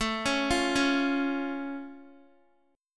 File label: A Augmented A down Guitar; A+ arpeggiated